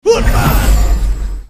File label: stun; war3source; hammerstorm